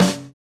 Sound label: snare, snare house